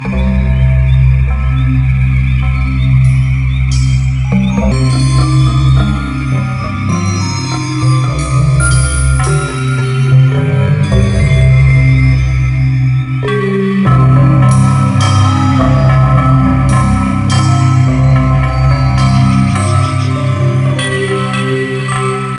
Menus Loop; Junior Mystery Quest

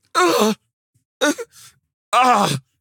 crawl

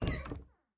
Hiding Spot Exit; The Town

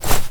walk foley

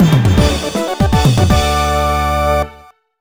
Cleaning Success; fossil fighters; nintendo ds

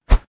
Basketball Shoot; Doodle Jump 2; Android

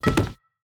Death Body; portal quest android; Paladin

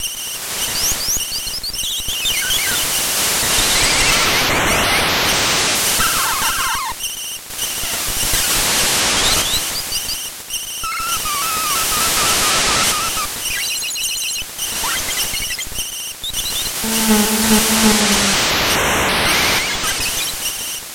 Ambient - Morning; gamerip; shovel knight king of cards

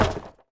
Wood Collision; angry birds rio

